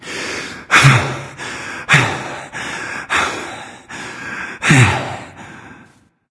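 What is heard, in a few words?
nonverbal breath; smite; voicepack; ullr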